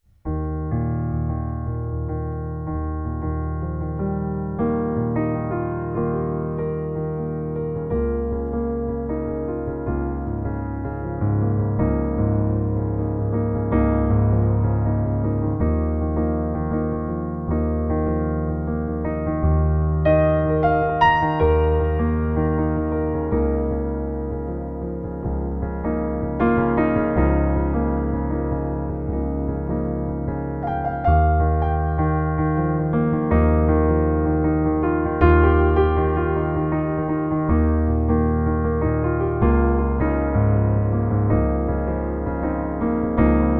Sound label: Shigeru Kawai Soft Grand